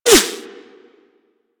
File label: Bullet Whizzby; Warframe; Weapons